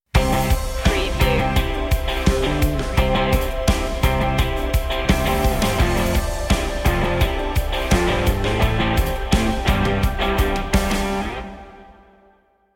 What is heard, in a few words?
pressured stinger